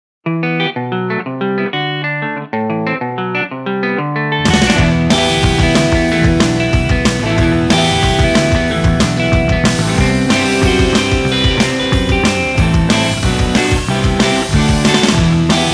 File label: Jangly Guitar Pop Archtop; Jangly Guitar Pop LIVE; Impact Soundworks